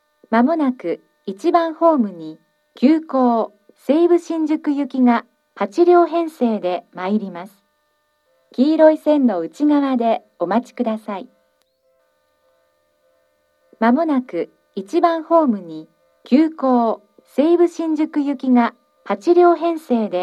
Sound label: express, station; seibu-shinjuku; musashi-yamato